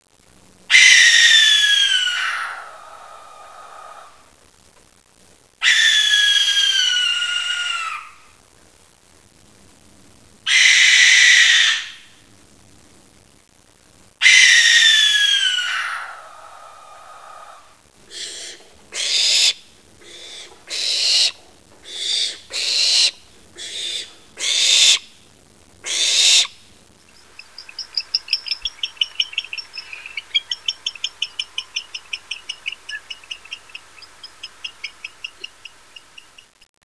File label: Barn Owl